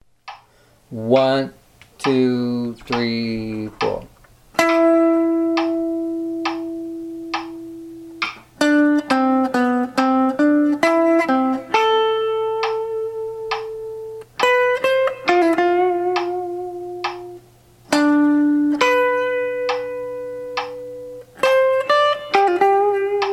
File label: melody